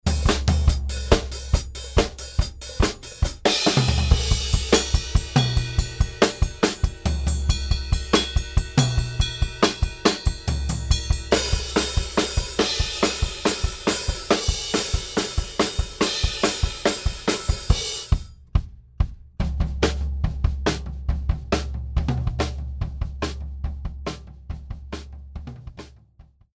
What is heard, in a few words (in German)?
DrumSet ohne Kompression Stereo Summe